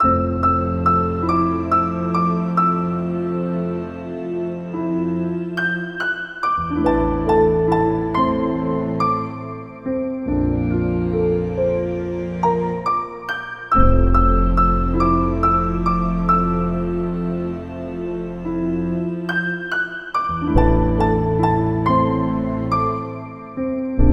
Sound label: modern deep deep ocean 140 bpm a min; monosounds